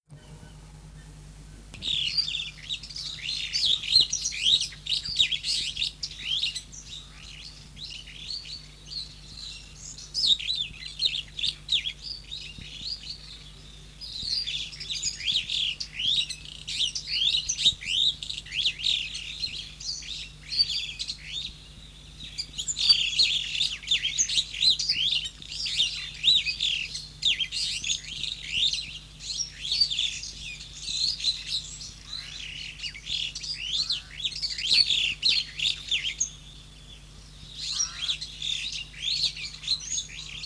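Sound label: Lamprotornis superbus -- Superb Starling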